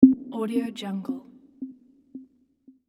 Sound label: ping notification